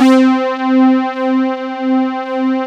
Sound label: SYNTBRAS C4, Synth